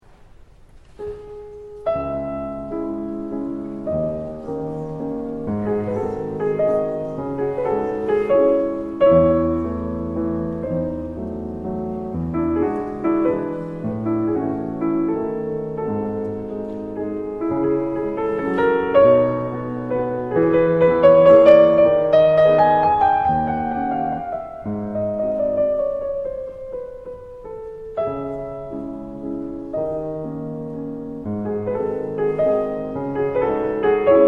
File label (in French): Piano